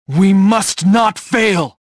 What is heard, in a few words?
anger; jedi